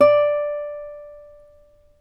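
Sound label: D4 CLASSIC, CLASSICAL GUITAR; Northstar - New Gold VOL-2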